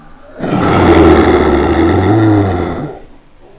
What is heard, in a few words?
die, monsters, spitter; svencoop; AoMDC